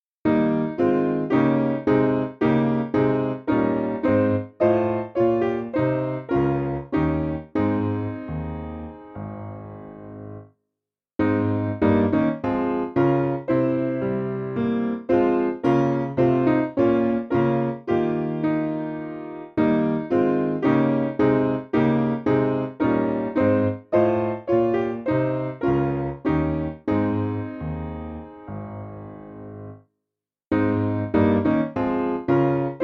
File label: Riches Unsearchable Dominica Piano